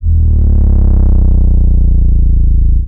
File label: Affected Bass E1